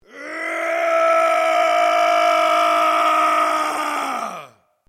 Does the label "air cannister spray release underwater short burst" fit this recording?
No